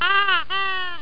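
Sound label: squeeze toy; Monster Media No. 2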